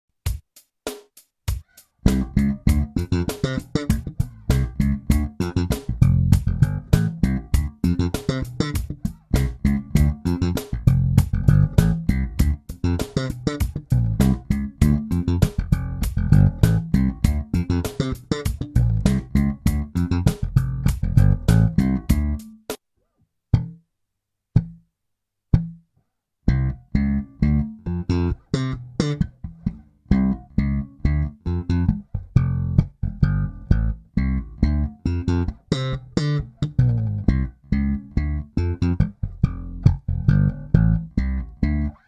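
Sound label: Slap bass D with double thumb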